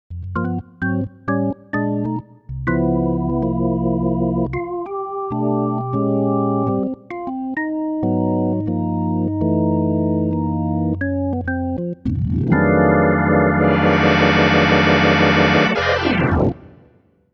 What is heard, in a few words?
tonewheel organ